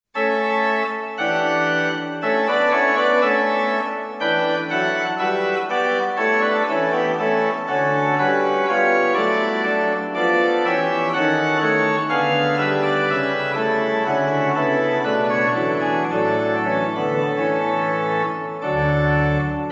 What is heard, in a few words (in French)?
marche nuptiale, orgel; aart de kort